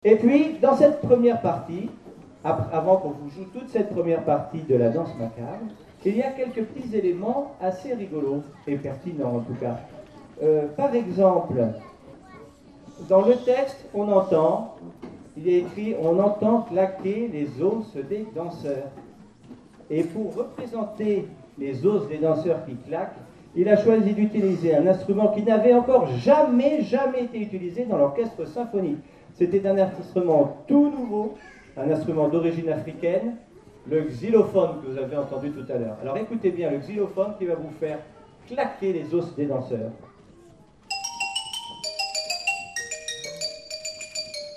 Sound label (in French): claquement squelettes